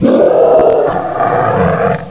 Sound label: geting